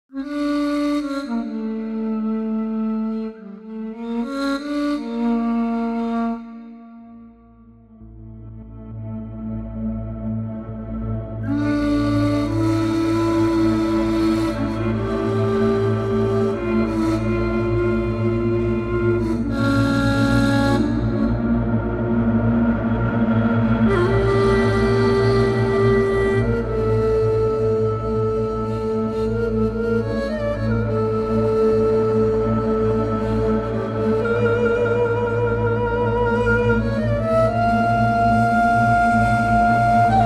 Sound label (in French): musique